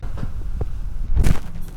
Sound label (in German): Die Stoepsel; Fieldrecordings; Brasilien